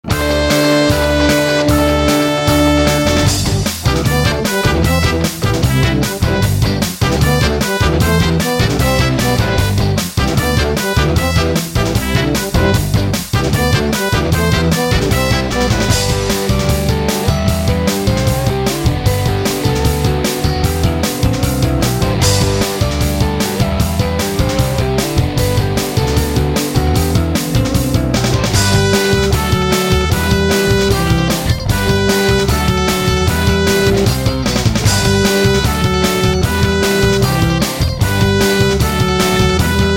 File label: Ska Intro Verse; Hal Leonard